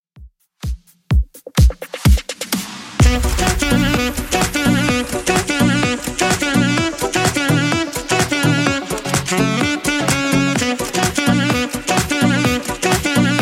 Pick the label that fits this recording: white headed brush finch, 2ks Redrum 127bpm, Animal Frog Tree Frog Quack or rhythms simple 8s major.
2ks Redrum 127bpm